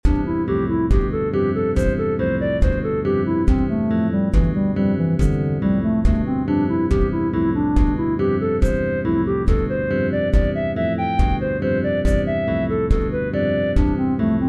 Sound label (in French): musique